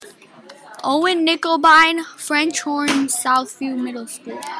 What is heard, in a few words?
frenchhorn